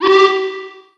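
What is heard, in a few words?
friendly alarm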